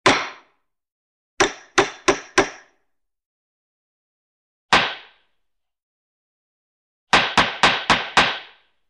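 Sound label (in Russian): zvuk molotka u sudi, judge's gavel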